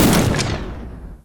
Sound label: WEAPON GUNSHOT Rifle Swish; GameBurp; 2000 Game SFX